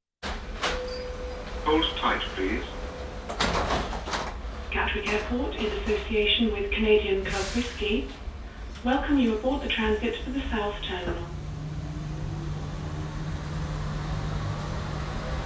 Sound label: Train interior; Travel & Transport